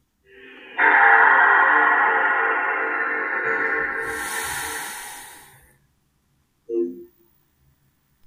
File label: PS2 turn on